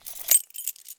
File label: Keychain Zip; SFX Kit; Foley - Misc